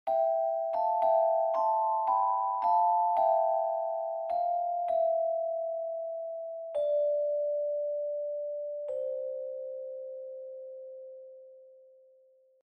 Melody Sad Xmas

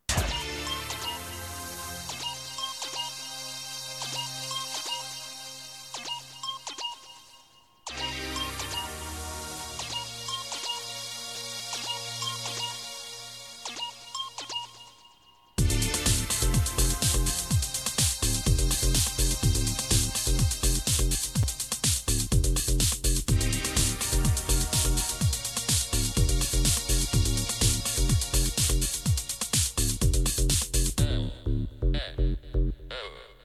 LFO

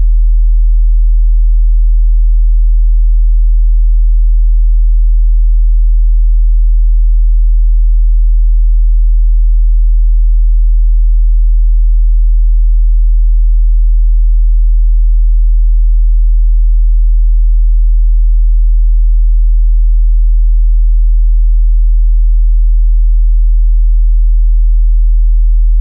Sine Wave 40Hz

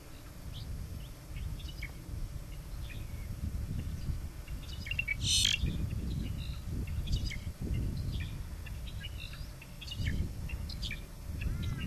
Brown Songlark

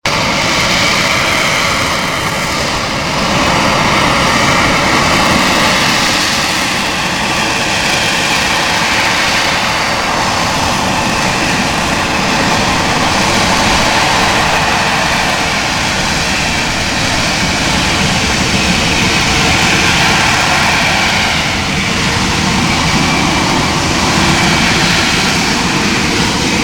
Harrier hover

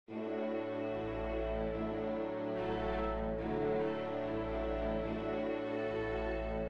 Ring Leitmotiv, mid

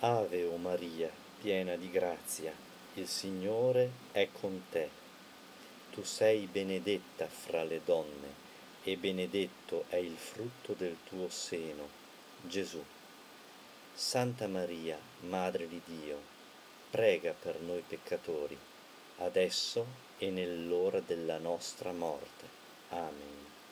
Ave Maria Italian

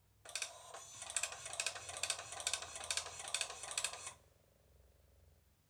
hewlett Packard 41CX hello World

